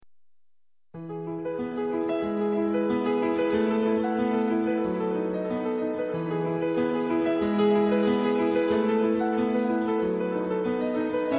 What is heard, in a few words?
new age